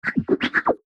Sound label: Logo Chopper Pan Lfo; Sonic Logo; Blastwave; Sonic Logos; Production Element Imaging Element Accent Transition